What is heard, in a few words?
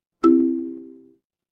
feedback confirm